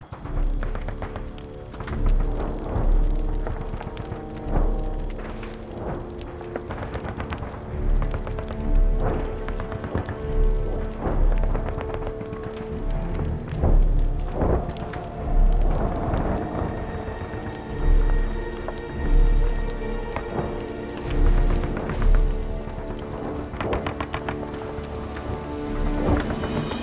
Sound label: Menu Screen; Wolf